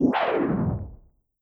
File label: beast roar